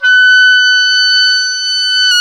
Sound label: OBOE, Orchestral Winds, Oboe mf f